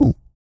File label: Vocal, Blues & Soul; CUICA MAN